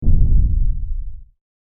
War Zone Single Distant Explosion, bomb; explosion sound effect

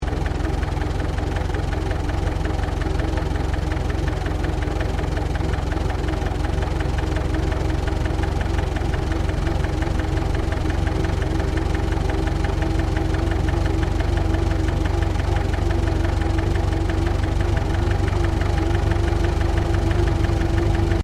Truck Chevrolet 1926 30cwt Drive Slow; Classic comercial vehicles; Trucks (Sound Effects:Vehicles)

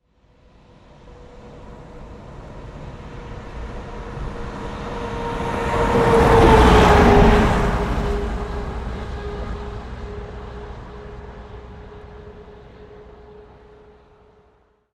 Semi Truck Drive By; Vehicle; Trucks; Vehicles

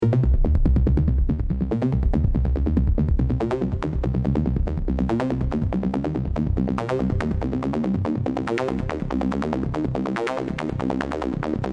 Techno Trance Supernova Loop In C 142 Bpm; Royalty Free Synth Loops

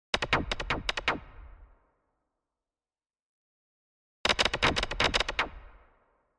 Laser blasts bouncing off shields; Battle

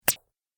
Arcade Game Thwip; Video Game Sound Effects; Arcade Game Sound Effect - Retro Inspired Sound Effects - Think Nintendo, Atari, C64; Andrew Fleming

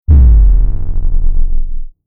Sine Bass Down Low Frequency Drop Stinger; Descending; Sound Design; Tom Hutchings

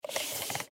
Pc Mouse Movement; Fast Mouse Pad; Computer; Technology; Computers; Blastwave

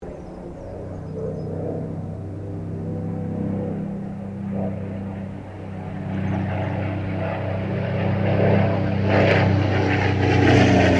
AIRCRAFT SUPERMARINE SPITFIRE MK-VIII LOOP STEREO; Airplanes

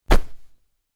Cloth Character Movement Foley Whoosh; Arm or leg movement with cloth foley.; Miscellaneous Human Sound Effects

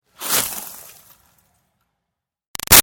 Snow Dust Sweep Fast; Weather; Big Room Sound

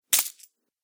Coins Drop; Money

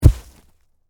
Impact Log Drop, On, Ground, Leaves, Heavy; Wood Sound Effects; BlastWave

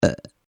Burp Female Burping; Burping Sound Effects; human

